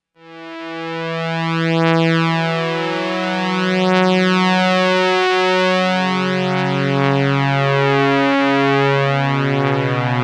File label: korg ex8000